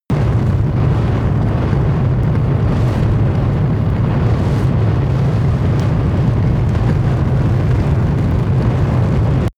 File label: fire object large burn